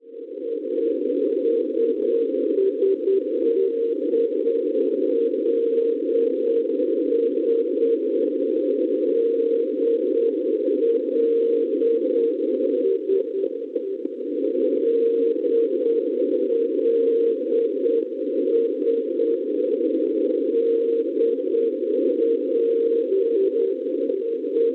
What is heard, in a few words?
NDB 412 kHz; SE; Strasburgo; Francia; Schwarzsee